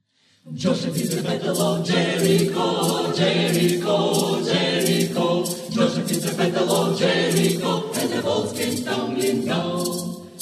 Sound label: Ioffe chorus Joshua